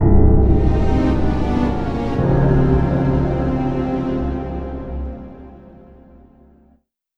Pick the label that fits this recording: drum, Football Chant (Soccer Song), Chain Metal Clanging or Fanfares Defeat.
Fanfares Defeat